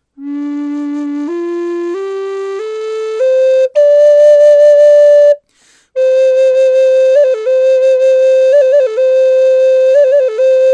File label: Maple Burl Classic